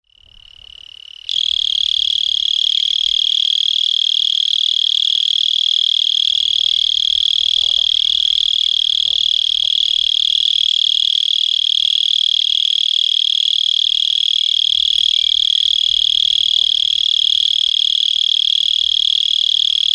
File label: mole cricket